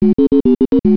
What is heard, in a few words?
button; cryocell